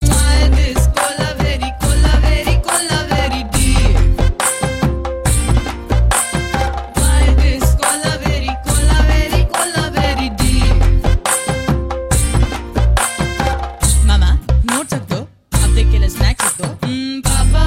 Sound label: Female